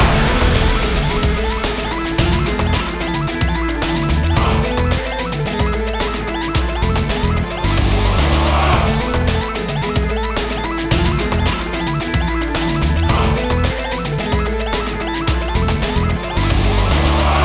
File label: Big Win Loop